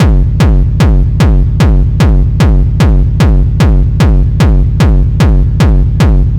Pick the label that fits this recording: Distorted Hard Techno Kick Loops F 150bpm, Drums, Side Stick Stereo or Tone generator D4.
Distorted Hard Techno Kick Loops F 150bpm